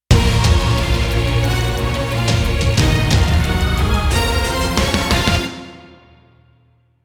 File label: Dungeon World Boss Intro